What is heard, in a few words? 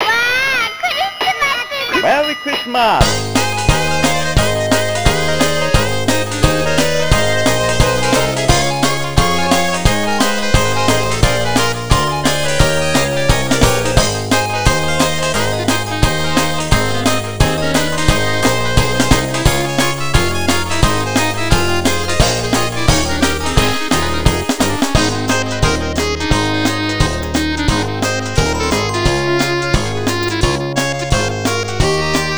Jingle Bells